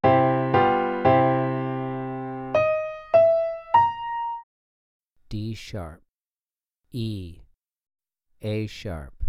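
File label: 3 Note Melody Piano Major d#4 e4 a#4; ear training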